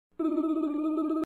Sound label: walking